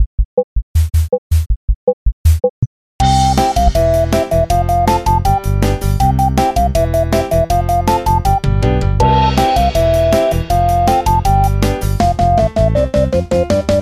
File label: cheerfully happily powerfully, jingle